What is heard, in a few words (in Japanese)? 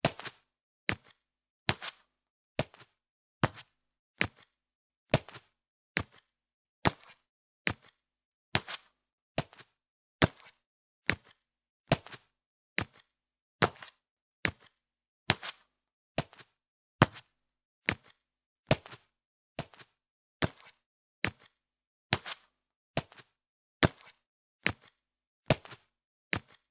足音 スリッパで歩く